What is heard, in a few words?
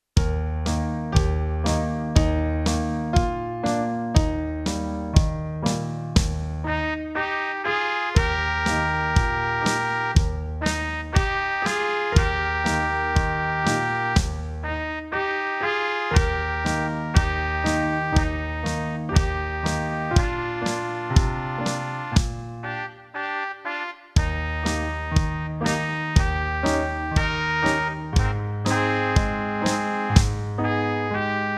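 The Saints in Eb, backing tracks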